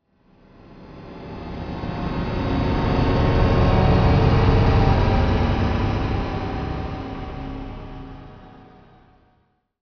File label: time hole